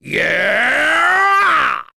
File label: win grunt